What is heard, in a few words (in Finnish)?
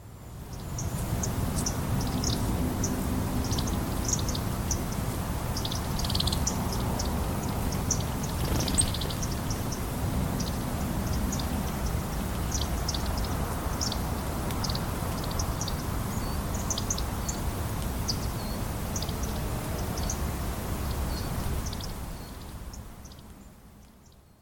keltasirkkujen porinaa; linnut